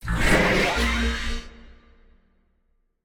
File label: Field Mat Tap; Yu-Gi-Oh! Master Duel